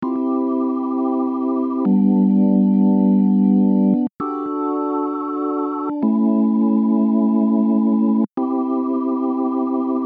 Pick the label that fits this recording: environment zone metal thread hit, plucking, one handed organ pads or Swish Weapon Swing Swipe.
one handed organ pads